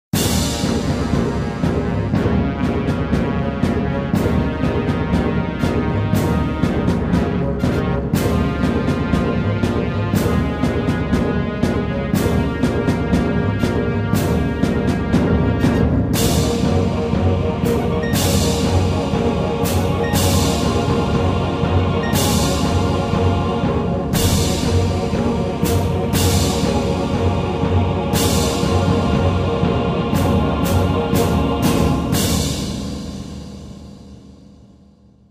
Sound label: battle war; Gladiator Begins; PSP